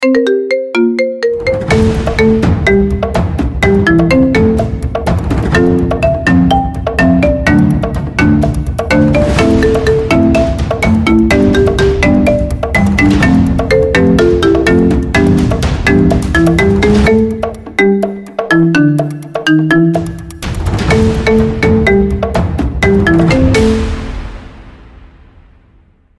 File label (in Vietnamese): nhạc chuông tiếng trống; tu do one piece